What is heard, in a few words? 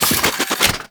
Fist Spin; Enemies; Warframe; Tyl Regor